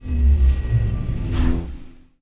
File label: wormhole incoming ship; Stellaris